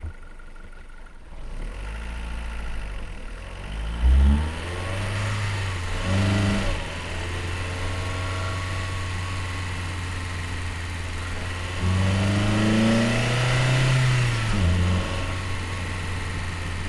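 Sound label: vw polo onboard fast drive stop engine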